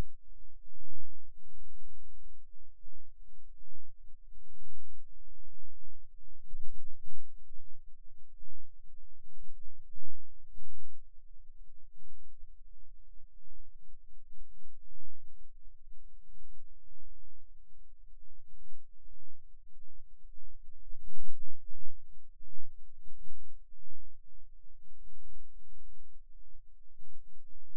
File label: Rhythm Wave, eeg seizures